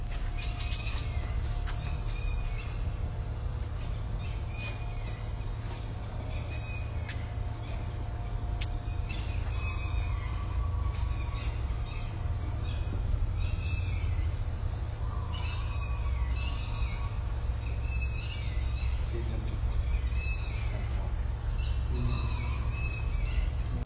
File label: bird